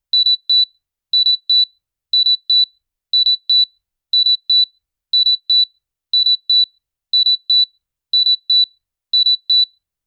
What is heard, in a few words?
a single loud beep signaling the end of a timer